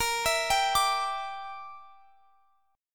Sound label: G Minor 6th A sharp